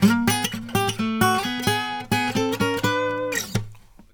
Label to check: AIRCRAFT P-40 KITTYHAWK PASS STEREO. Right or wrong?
Wrong